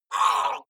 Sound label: water monster female gargle shout attack short; koerpergeraeusche; comedy-cartoon; soundpool